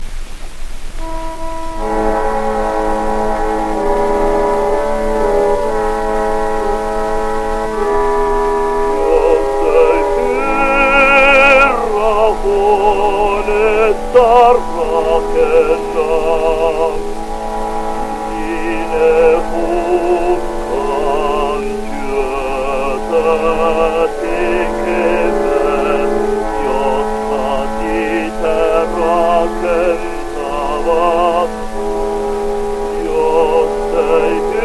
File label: Gramophone